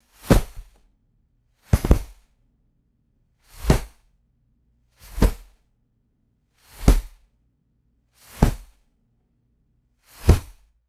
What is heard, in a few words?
heavy cotton towel dropping on hard floor; cloth